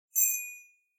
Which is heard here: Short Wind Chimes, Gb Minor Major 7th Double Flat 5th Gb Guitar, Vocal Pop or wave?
Short Wind Chimes